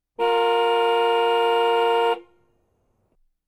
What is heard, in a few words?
Sedan Car Honking